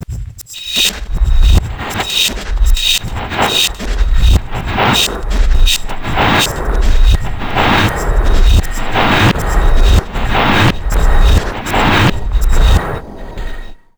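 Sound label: neuro, drum loops crazy processed, 80 bpm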